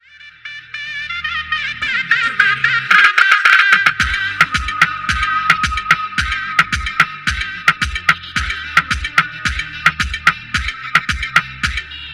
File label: Shenai Ringtones